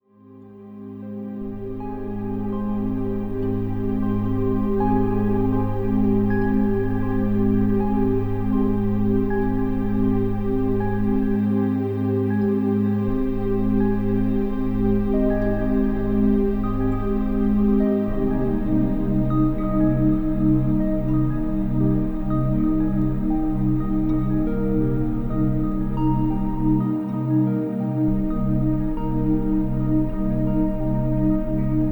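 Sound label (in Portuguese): Música relaxante; Relaxamento Soundscape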